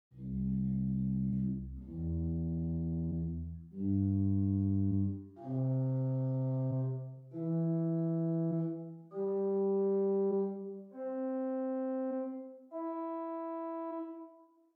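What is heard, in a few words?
Pedal Octave 8 arpeggio, organ; Hauptwerk; St Annes Moseley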